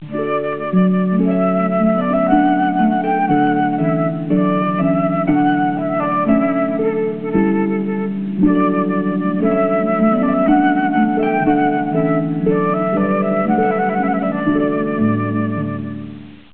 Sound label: trumpet